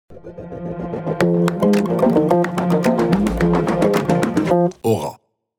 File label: Jingle FR